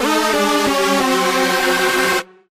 Lose; NBA King of the Court 2; Android

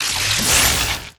Enemy Thunder Charge

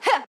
Swipe; Going Under; Jackie

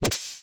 Block Set

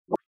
Gulp

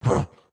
Chop Wind Up; Caffiend Caelan

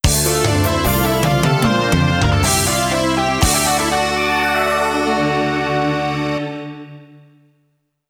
Stage Complete [Roland GS]

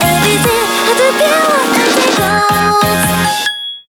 Bgm Reach

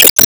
Emo Pain

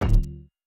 Armor Hit

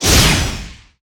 Timebomb Generate

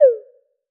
Fall Mid

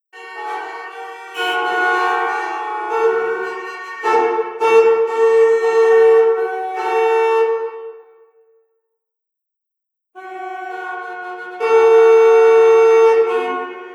Cities lon Horns

